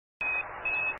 Quindar Nasa Tone

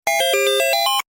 1up; Xevious

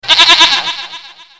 Goat Sound

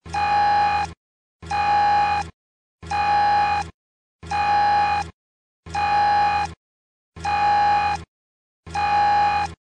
Lost Alert

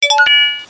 Dhl Scanner Very loud

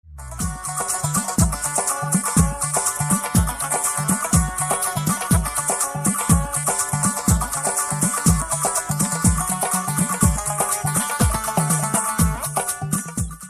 Lovely Music

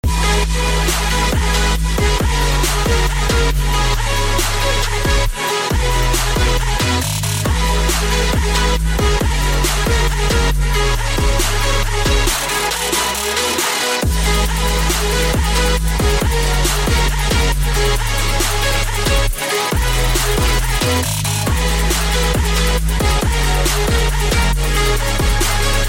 Trap Music Inspiring

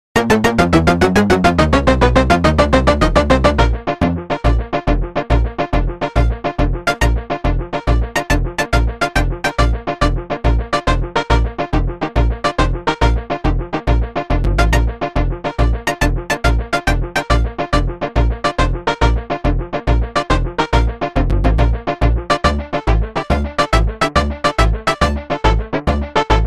Spooky

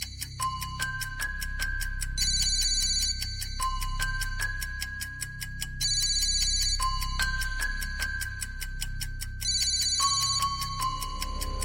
3g Phone Call

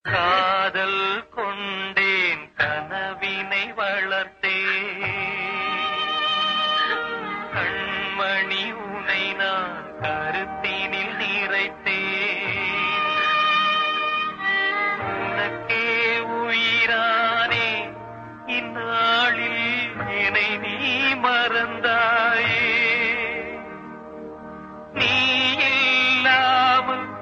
sad love

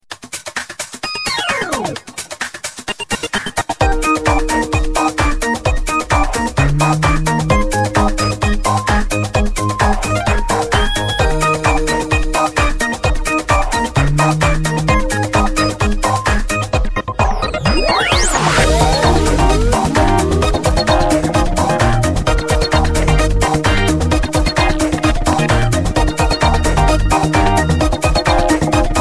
8bit

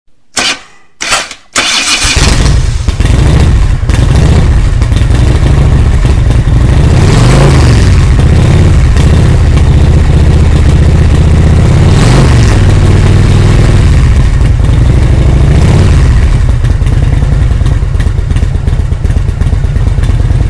Motorscooter sounds

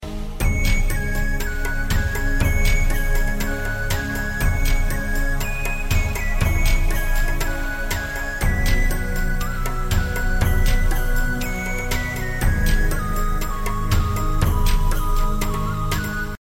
Celtic dance

